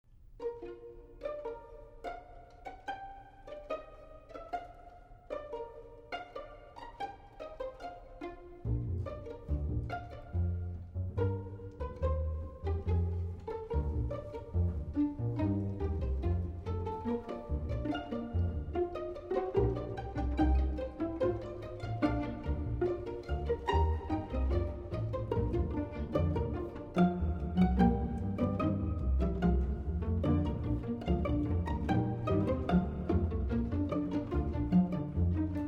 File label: Rounds and Canons, Allegro pizzicato; Rounds and Canons (excerpts)